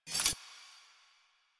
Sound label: Idle Sword Drop; Arthur Hub; Warframe; NineteenNinetyNine